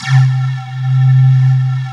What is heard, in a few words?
bottle C2; BOTTLEMAN; Synth